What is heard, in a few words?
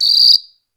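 Brazil cricket, World Whistles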